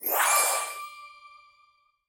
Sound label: Spawn Special Candy; candy crush saga gamerip